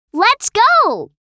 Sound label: Teenage girl cheers and support; misc human sound effects